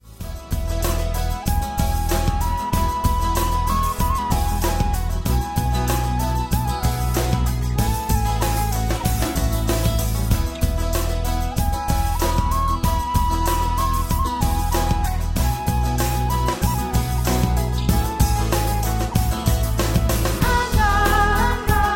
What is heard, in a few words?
Solar Tambourine